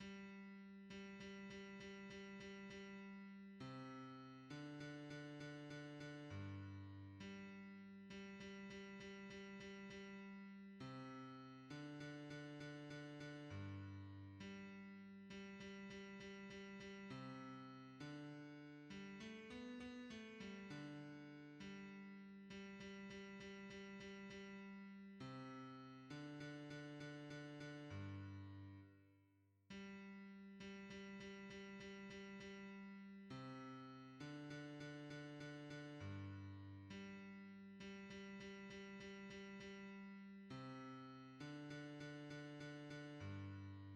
hymns, bass

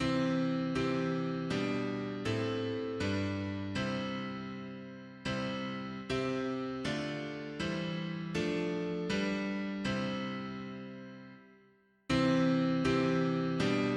mono piano, hymns; Marcher en ta presence

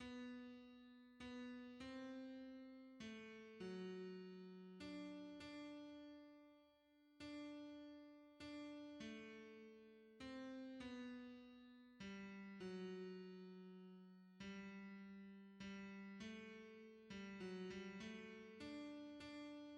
hymns, tenor

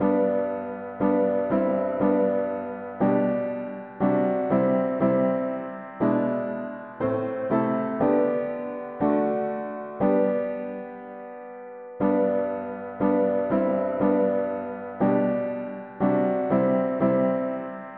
mono piano; The Holy One who knew no sin; hymns

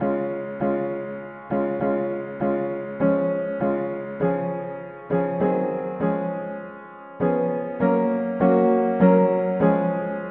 mono piano; hymns

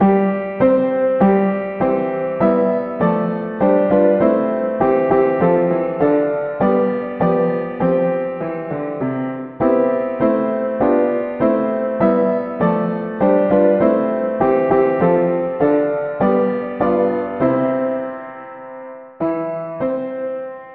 mono piano; hymns

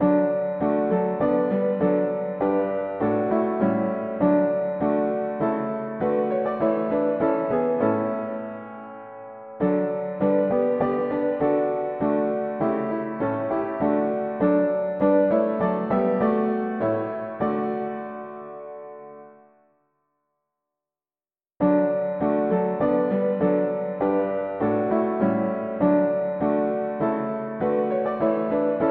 mono piano, hymns; Praise ye the Lord again again

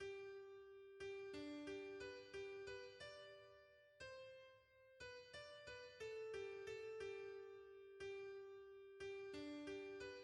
hymns, soprano; As debtors to mercy alone

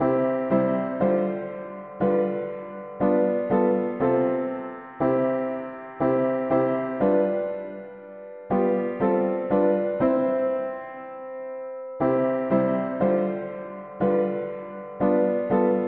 mono piano, hymns; Father we adore Thee

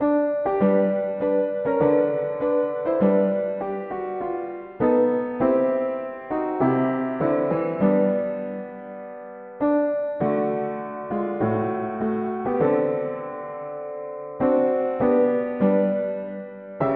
mono piano; hymns

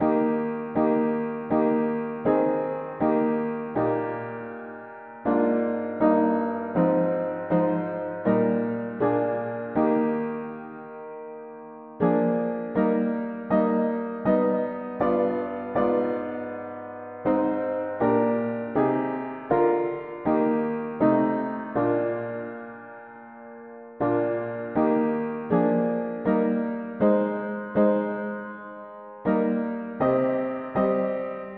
mono-piano; Notre Dieu notre Pere combien sont merveilleux; hymns

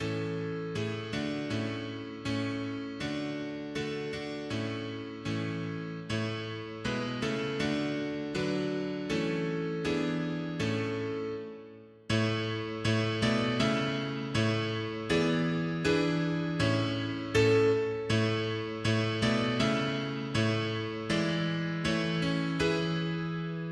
mono piano, hymns; Jamais je n aurai

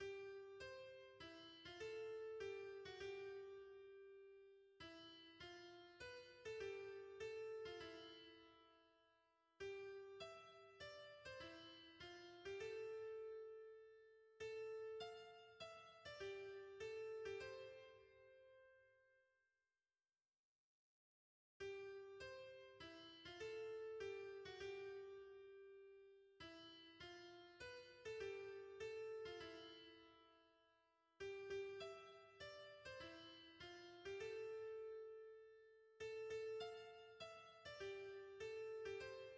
hymns, soprano

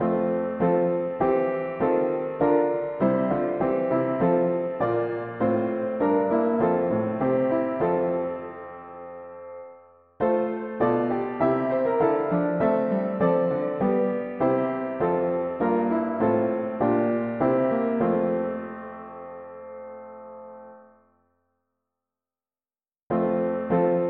mono piano, hymns

